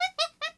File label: chimpanzee